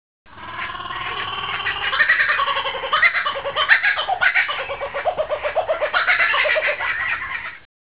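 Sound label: jungle ambience; valve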